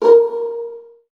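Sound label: viola pizzicato A#2 right, Virtuoso Strings; ILIO; Kirk Hunter Virtuoso Strings VOL-2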